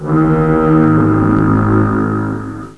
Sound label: FOGHORN